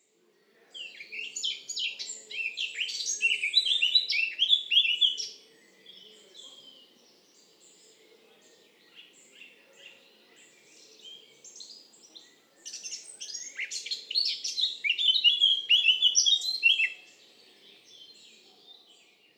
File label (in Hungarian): barátposzáta Sylvia atricapilla